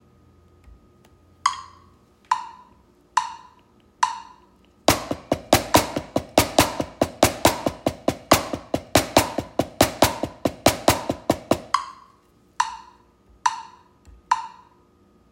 single hand, drums

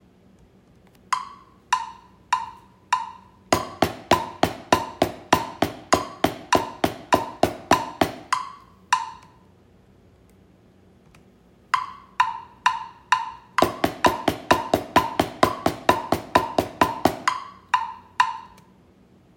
double stroke roll, drums

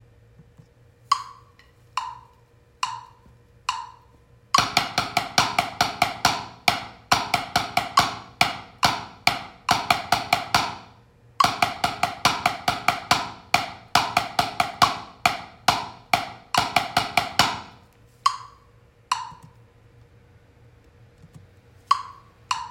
sixteenth, drums

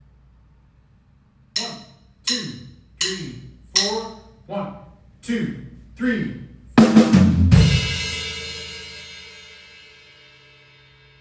starter fills, drums

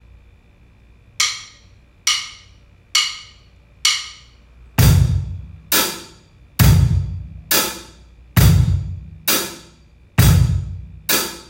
quarter beat, drums

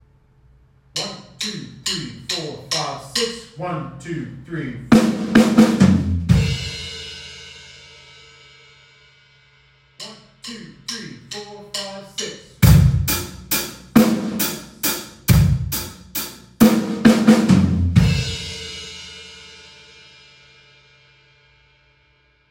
6 8 fills, drums

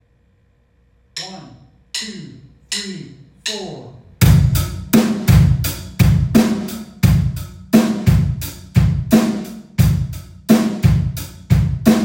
eighth kick, drums

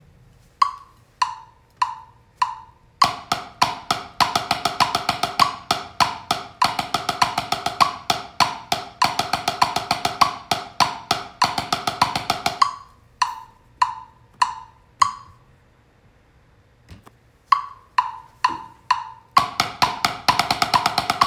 doubles paradiddles, drums

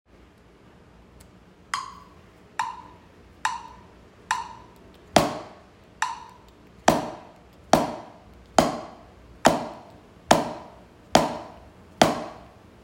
quarter, drums

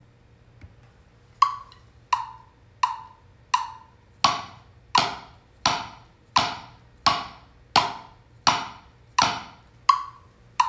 paradiddles, drums

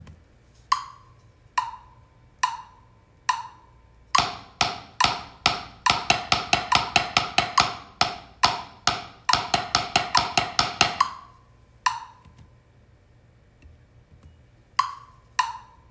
sixteenth, drums

